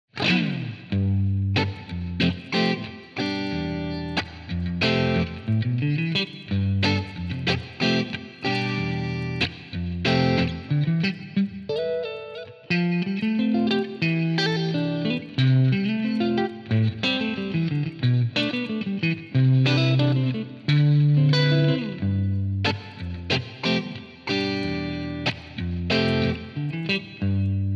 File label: Clean Rhythm